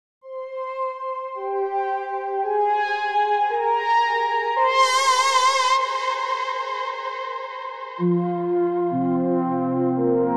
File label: gloomy brass